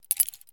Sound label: rgd throw; Escape from Tarkov